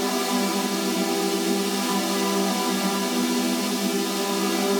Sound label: Pad Loop G, shimmer and sparkle